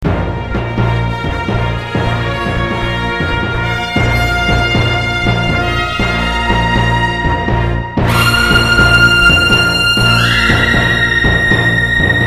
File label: brass